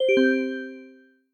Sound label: chat end